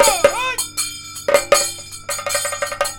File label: KATAK, Indian Traditions